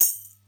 sparkle pedal hi hat sound g key; tuned drums

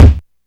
puffy kick drum single hit d sharp key

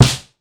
smooth snare sound b key; snare drum samples

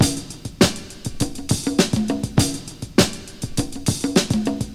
101 bpm breakbeat f sharp key; drum loop

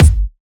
kick drum single hit g sharp key; Duration 0:01; kick drum samples

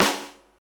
wet snare one shot c sharp key

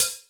original pedal hi hat sound f key; Type Wav; Duration 0:01; Samplerate 44100 Hz; Bitdepth 24

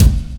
sharp kickdrum sound a sharp key; kick drum samples

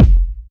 solid kickdrum sample f key; kick drum samples

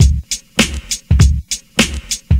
100 bpm breakbeat c sharp key

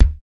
steel kick drum sound d key

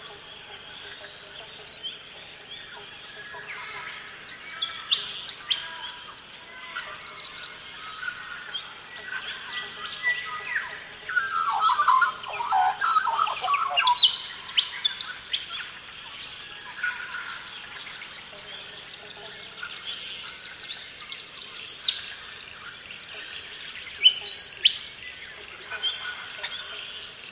common eiders calling funny; soundscapes

